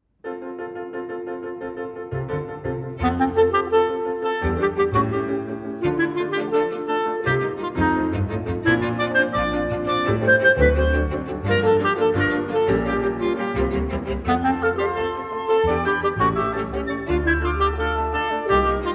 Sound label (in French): instrumental melodie